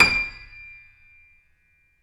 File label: D 6, YC7 piano, mf; Roland L-CD701